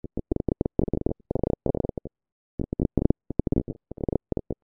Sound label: crumpling; Continuous crumpling; The NIW Project; NIW: Natural interactive walking